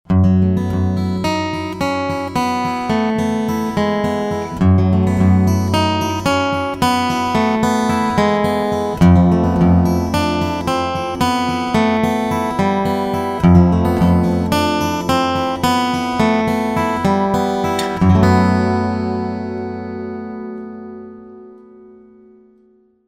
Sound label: complete slow